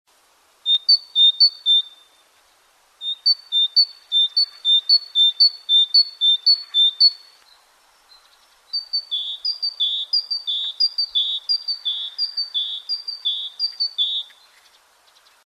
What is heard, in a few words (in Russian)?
zvuki sinic